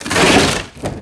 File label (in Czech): zidle; Mafie 1